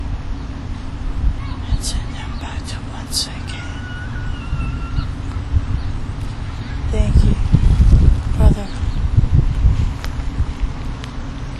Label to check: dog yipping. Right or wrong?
Right